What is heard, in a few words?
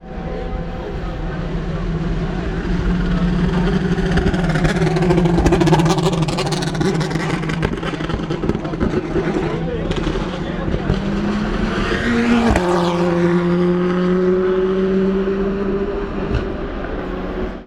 engine problem tertre rouge